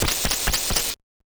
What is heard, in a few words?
Casio, Glitched, malfunctioning synth